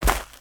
footsteps; smite; god merlin

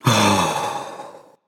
nv breath; smite; xbalanque

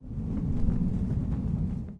angelic defeat wind; Smite; Thanatos

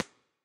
Clap; smite; cupid

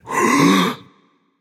breath; smite; voicepack; hachiman

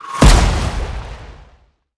explode; smite; god sol

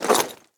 armor heavy; smite; chiron

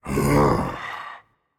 sigh, voicepack; smite; jorm

